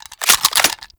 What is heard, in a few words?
AR Clip Out; Warframe; Weapons; Tenno